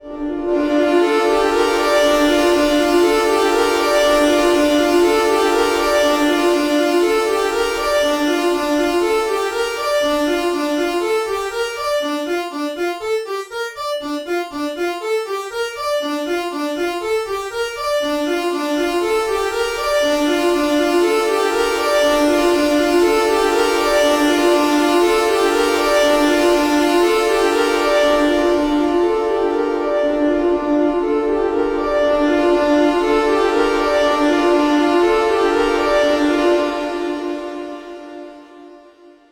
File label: Multi Part Pad; Waldorf Kyra